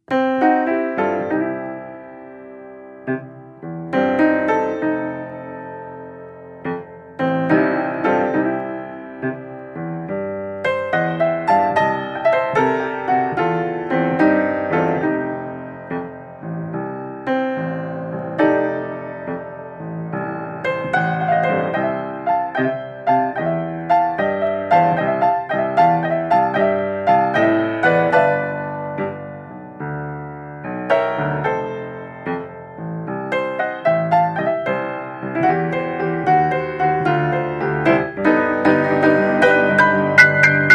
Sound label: Piano Blues